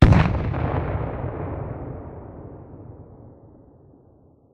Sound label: hegrenade distant detonate; weapons; Counter Strike Model Pack; CS2